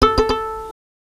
Violin Extended Technique

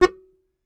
Very Short Note, Melodica

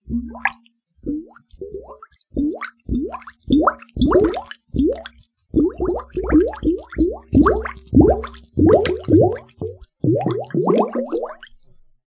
Bubble Chain; Bubbles

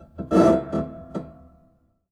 String Rub Erratic, Piano; Piano Miscellaneous

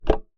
Key Noise, Melodica

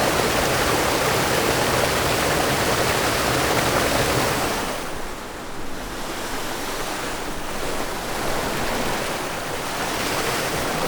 River Moving Microphone

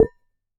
Damped Hit, Glass, Large Wine Glass

